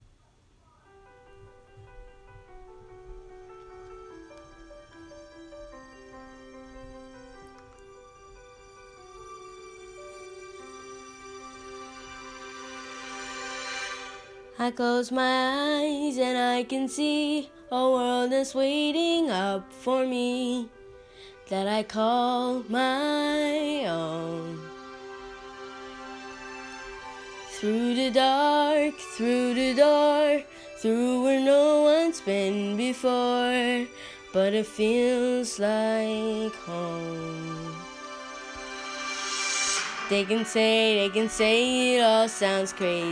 영어 여 노래

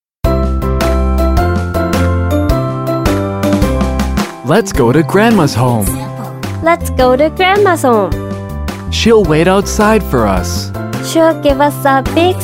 영어남 Chant Song

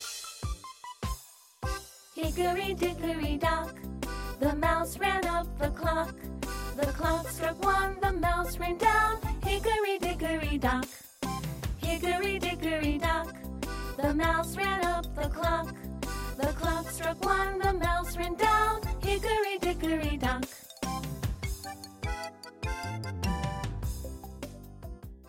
영어 여 노래; HickoryDickoryDock

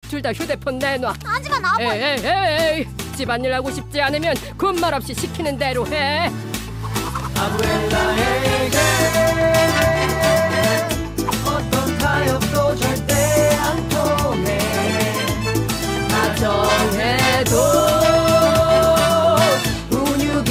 할머니랩; 햄스터와그레텔

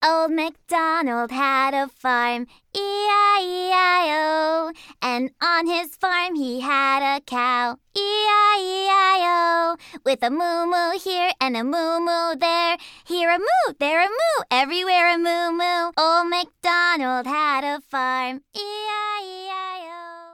영어여 동요

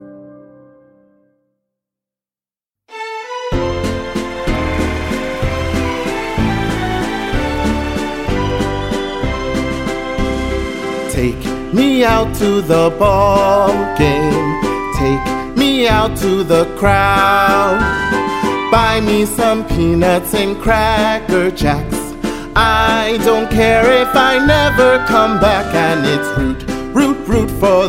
영어남 동요

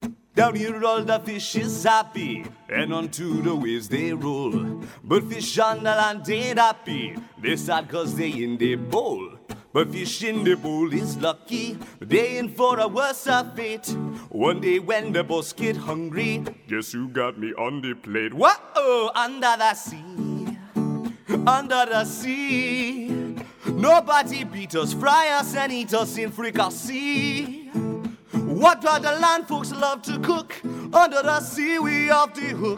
영어남 노래

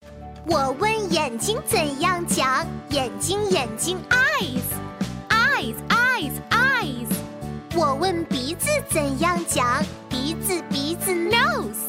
영어 여 챈트

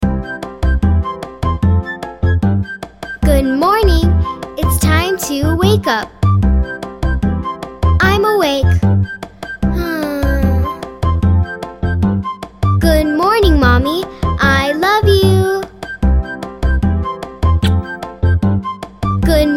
영어 여 여아 인사 노래

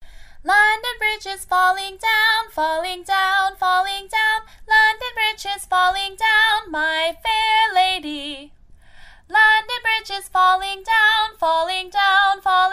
영어여 song

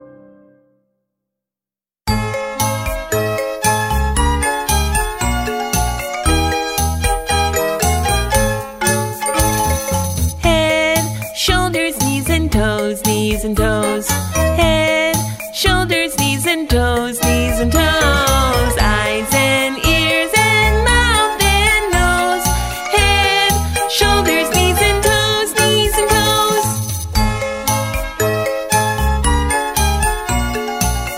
영어여 노래 여아

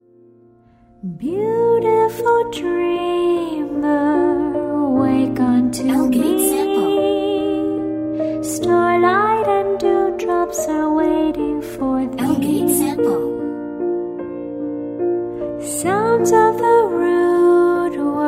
영어 여 노래; BeautifulDreamer

